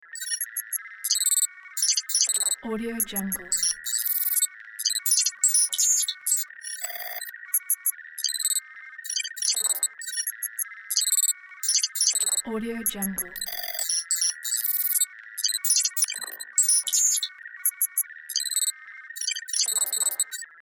data transfer

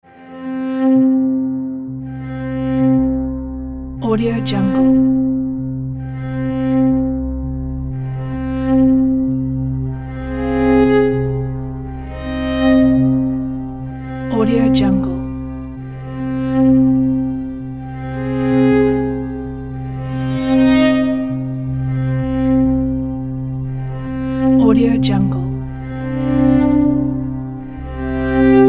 drama string suspense

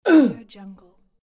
male ehh

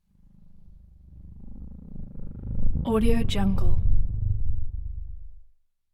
energy pass bys slow synth pulsing buzz hum; prosoundfx

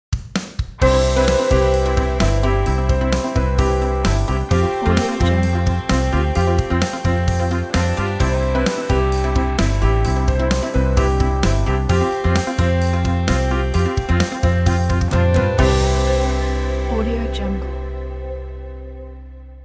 news ident; applehillstudios

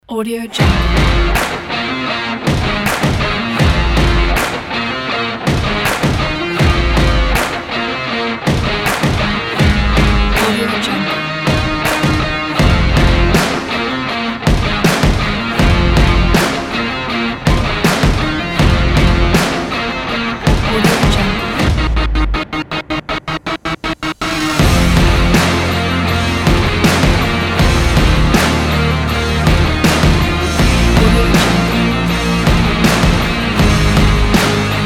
rock sport trailer

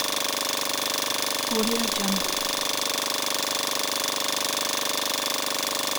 film projector; lokohighman

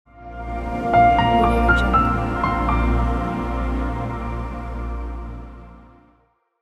corporate logo

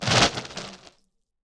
scifi biomechanical impact; stormwaveaudio

